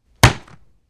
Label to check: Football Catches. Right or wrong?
Right